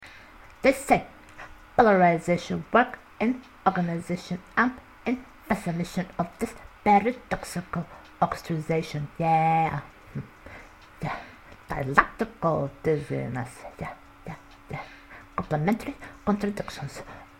Edgy Rapping And Singing trap 130 Fm; acapella

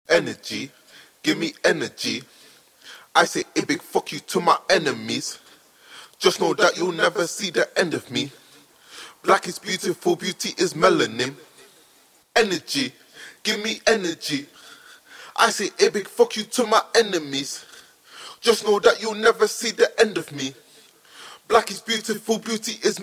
trap, 156, Bbm, Acapellas; Energy

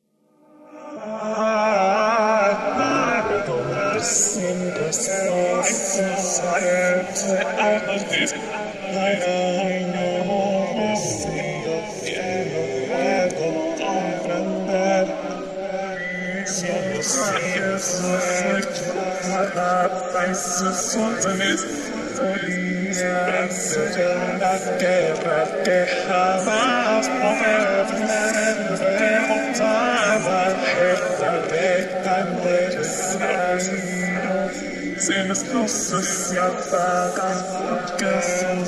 Acapellas, electronic 80 C

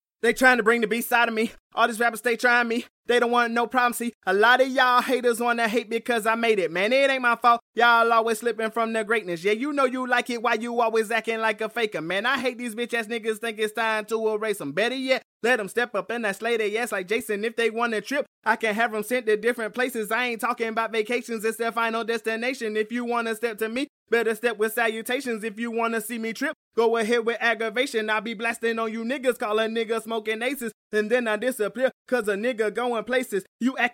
rap, 17, Am, acapellas

